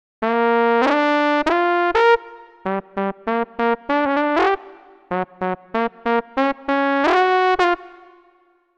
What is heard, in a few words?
Trombone